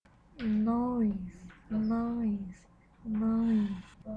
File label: noise